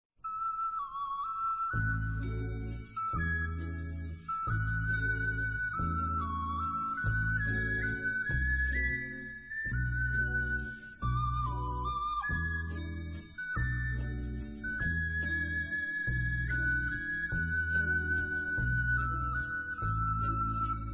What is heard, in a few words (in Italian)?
Sentimentale (versione ocarina); When Women Lost Tails